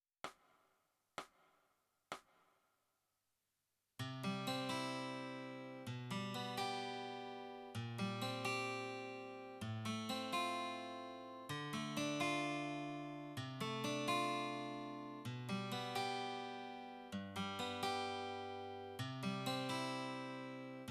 Guitar

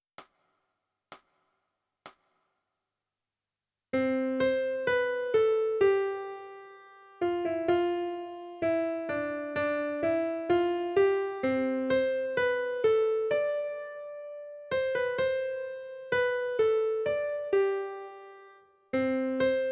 Melody

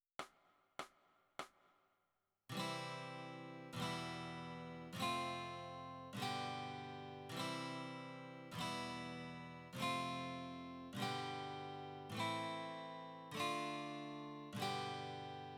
Guitar

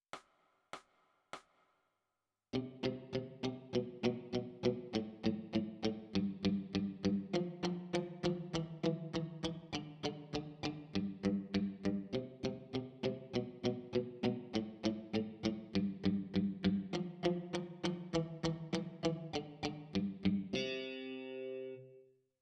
E Guitar

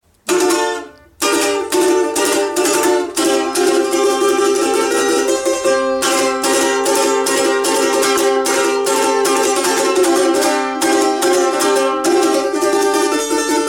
balalaika; グルジア民謡概説

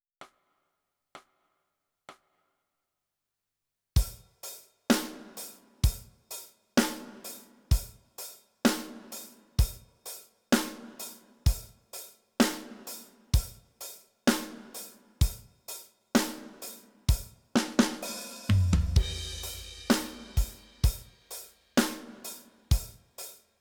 Drums

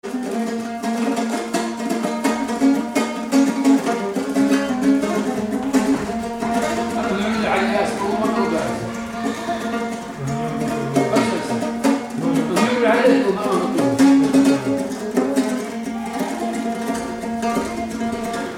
tampur

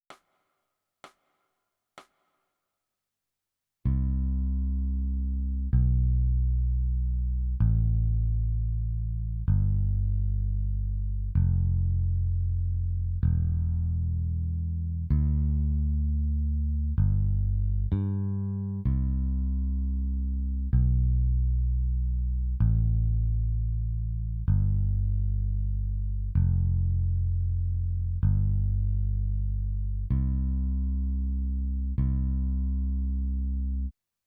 Bass

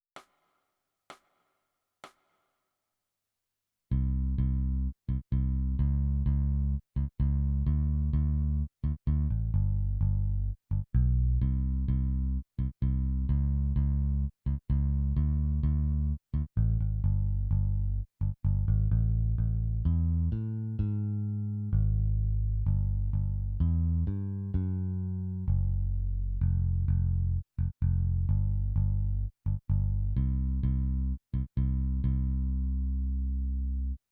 Bass

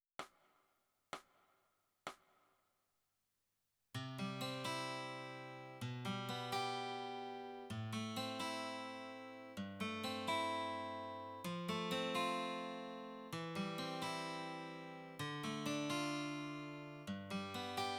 Guitar

